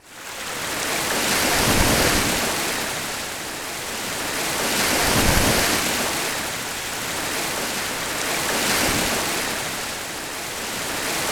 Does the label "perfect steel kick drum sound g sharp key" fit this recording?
No